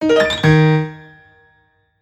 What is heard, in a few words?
Piano Hit